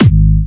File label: Fatterr G#4, Big Room Kicks